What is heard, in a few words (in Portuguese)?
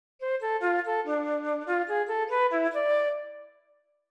Sonata para flauta em mi menor; Bach